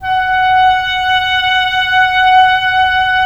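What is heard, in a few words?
wind cor anglais; LA Composer vol. 3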